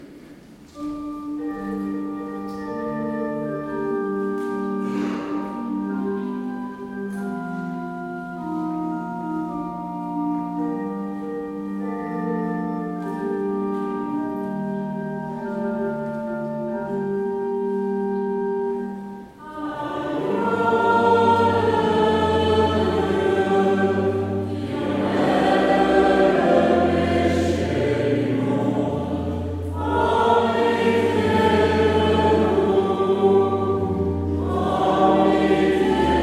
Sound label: Agnus Gospel